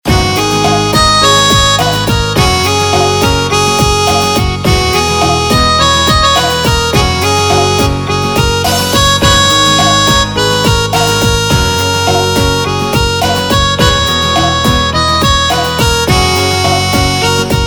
Instrumental Ringtone; Bollywood Hits (2014)

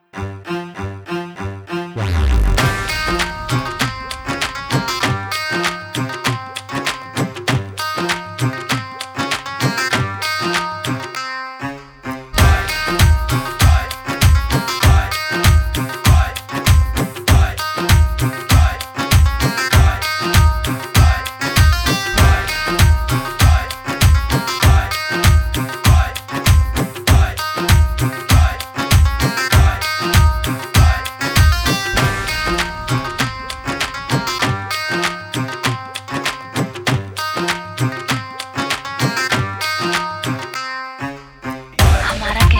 Instrumental; Punjabi Bhangra

